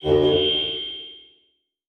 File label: Transition Hit Impact Electronic; Adobe; Production Element